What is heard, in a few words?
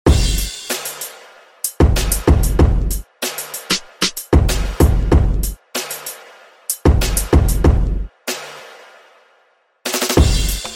slow drum ringtone; galaxy s9 plus; galaxy ringtones